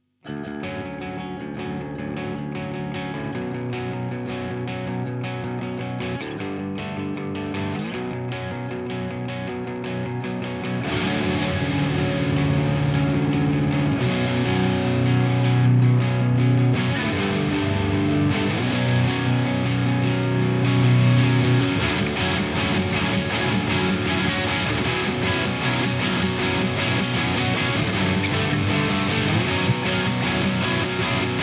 ch1 vintage, mesa boogie dual rectifier

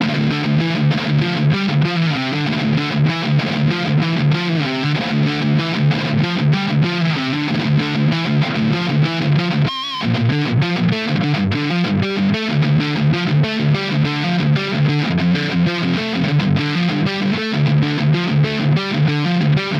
high gain hals, Gitarre, ESP LTD MH 1001 NT

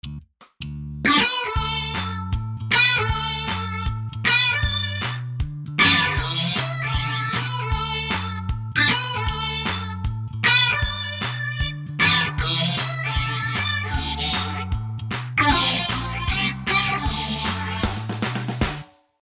accordina

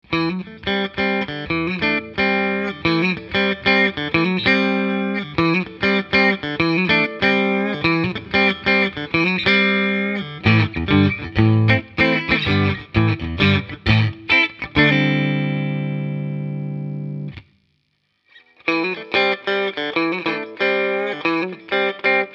tonestack test, fender blues deluxe

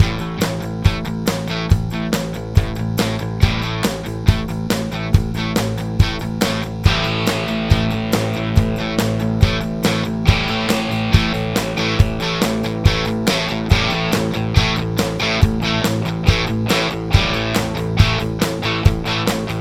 loop

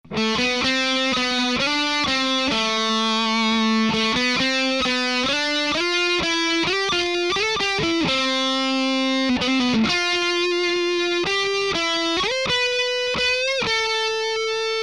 Horizon Lead, ESP Horizon, Langsames Solo

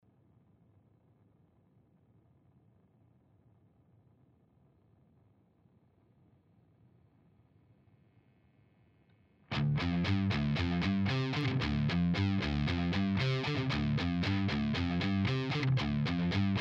test rectifier noise, Mesa Boogie Dual Rectifier

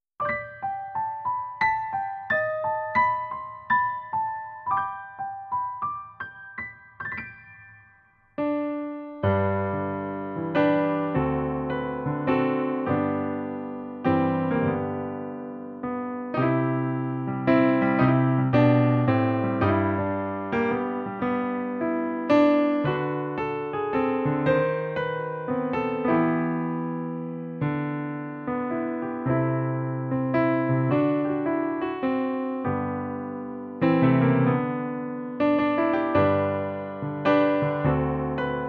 snow flakes lofi

